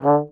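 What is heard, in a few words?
French Horn staccato D2; DirectWave; Varazdin Orchestral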